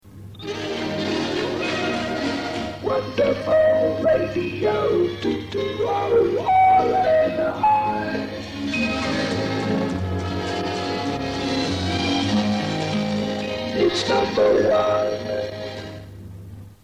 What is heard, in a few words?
jingles